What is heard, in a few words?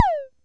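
mattel tom, Mattel Synsonics drum kit, lo fi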